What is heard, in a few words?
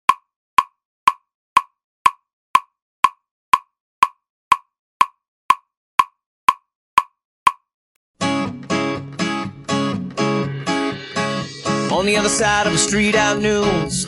Train Drive By Drumless